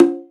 Open Conga, Alesis HR16B

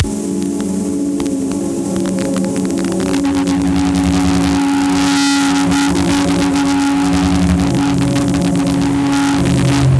Distortion Atmos; Zero-G Sound Sense Grime Scene; 90 s Sample CDs

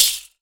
LARGE CRA, CRASHERS; Voice Crystal - Steve Reid Definitive Percussion

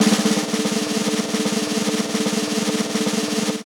Folk Snare Roll; Snares

